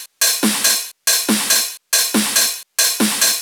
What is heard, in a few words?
Loops 140 BPM Hihat Percussion Loops